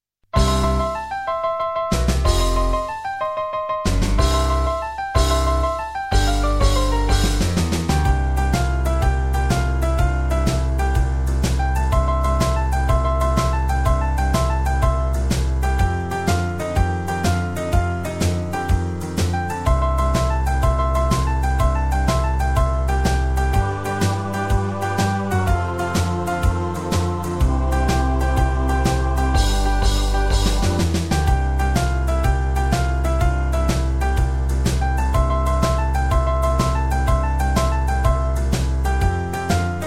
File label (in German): zirkuslieder zirkusblues playback